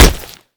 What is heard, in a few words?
damage, player; metrocraft